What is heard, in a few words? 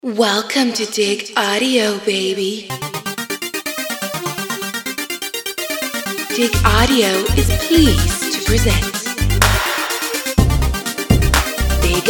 big ass synths montage